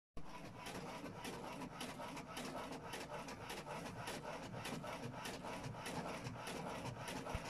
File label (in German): geraeusch